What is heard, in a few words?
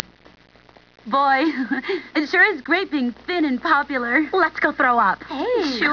throwup